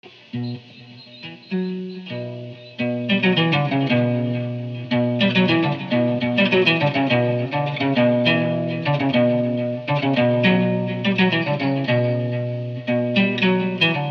Squeaky and Clean (Guitar Practice Loop with Reverb)